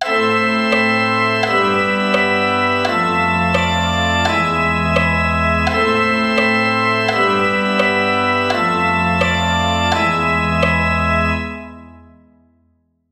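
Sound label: passacaglia a minor in 2 440; Passacaglia improvisatie begeleidingen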